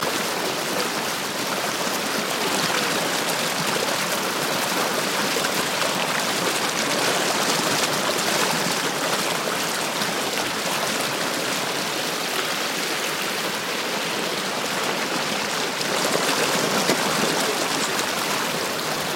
River or Stream Close

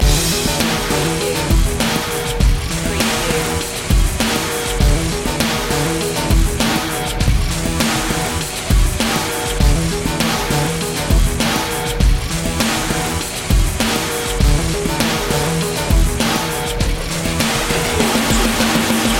beat boy loop

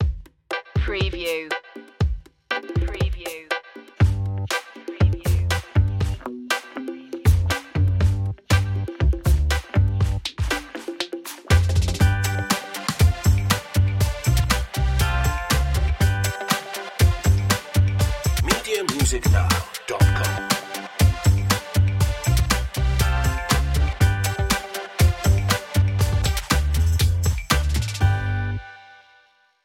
funky electro

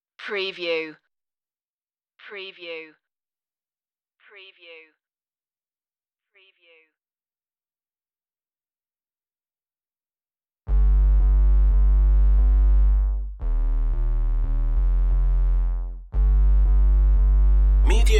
drums bass only

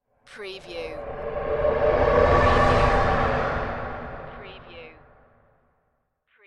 Spaceship Pass By

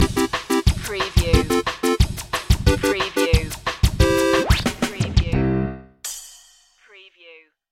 retro video game bubbles stinger

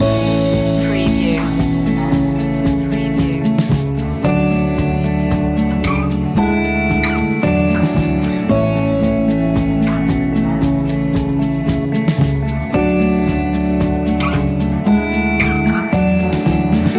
walking home loop

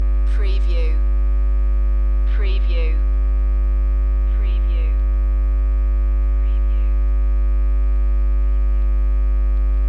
Electric Hum Static

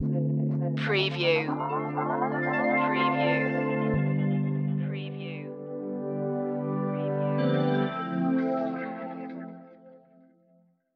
funky future stinger

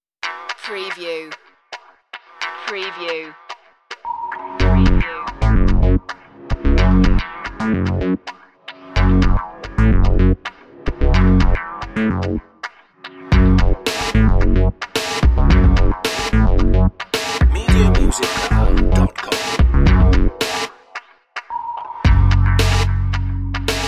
80s cop funk

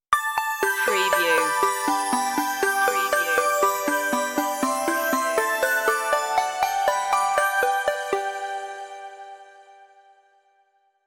merry christmas synthified stinger intro

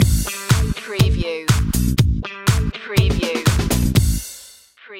disco bass

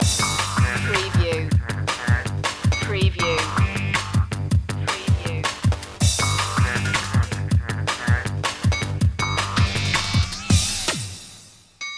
shock load loop

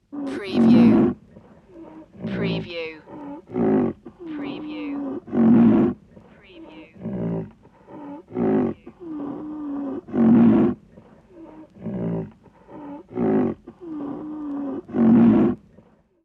Rhinoceros Grunts Vintage

